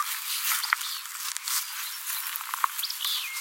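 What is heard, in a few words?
Willow Flycatcher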